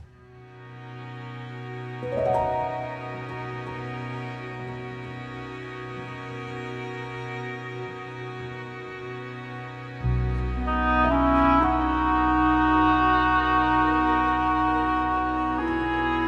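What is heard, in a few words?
title postgame 120bpm B Major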